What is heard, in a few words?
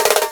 Fill Up; Mo' Phatt; Digital Sound Factory Mo' Phatt